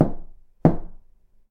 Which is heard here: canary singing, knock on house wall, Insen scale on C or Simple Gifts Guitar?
knock on house wall